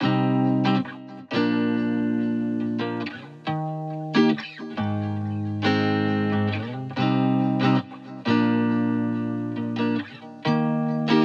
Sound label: 138 BPM C Min Main Guitar; Loop Stems; Cymatics; Miami Guitar Pack - Pt 1; Beach